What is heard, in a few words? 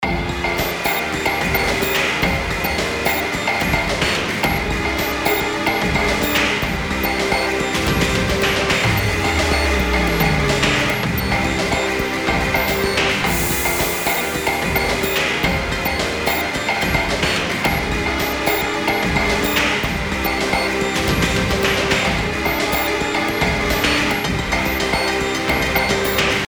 Cave; clony inc; capmon tap tap rpg; android